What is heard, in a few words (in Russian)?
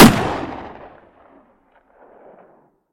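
удачный запуск сигнальной ракеты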